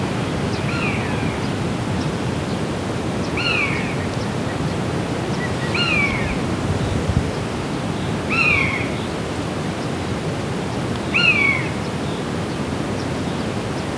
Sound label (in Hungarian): szeles időben faágon ülő egerészölyv; Poroszló; termeszetben